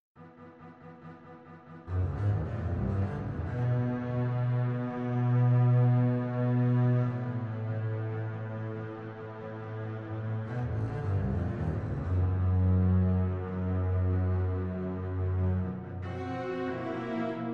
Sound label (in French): Duo contrebasse; contrebasse 5 cordes; ctb 5 cordes